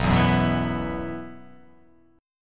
B Suspended 4th B down, chord; Bsus4 strummed; JGuitar

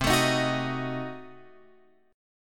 C Diminished 7th C, Guitar Open F; strummed; JGuitar

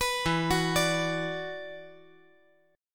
E 7th Suspended 2nd, down

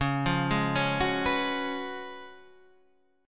Db 11th Db, Guitar, Half Step Down

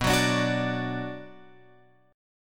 B Minor B Guitar Open F; strummed; JGuitar

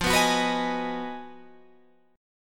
B 6th F, guitar chord; B6/F; strummed

